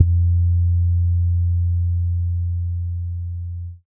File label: F2 Syn Bass